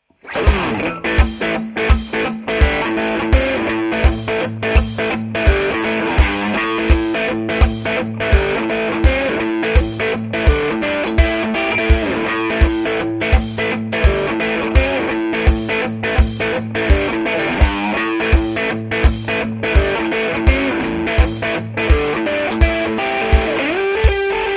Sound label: highgain bridge neck; schwarzbach; HB59-GrowlDogs